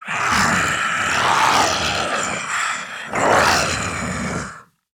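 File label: idle, zombie male; nmr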